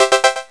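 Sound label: checkpoint; SRALLY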